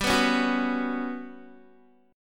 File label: G Major 7th Flat 5th, Guitar; strummed; JGuitar